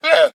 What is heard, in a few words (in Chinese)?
羊驼待机声